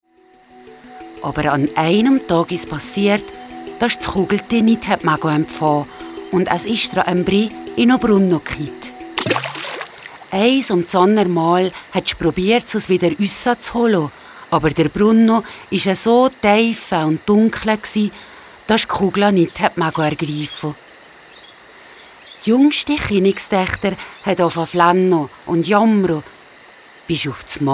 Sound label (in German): Frosch chinig